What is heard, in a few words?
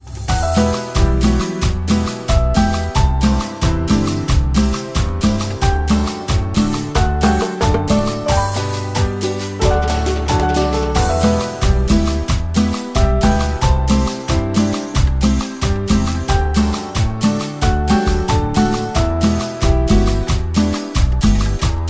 Sadness and sorrow